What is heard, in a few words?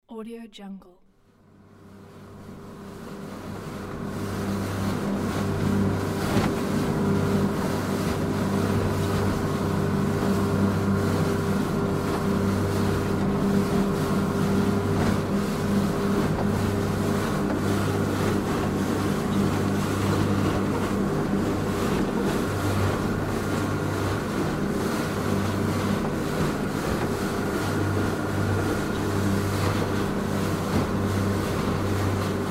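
speed boat interior ambiance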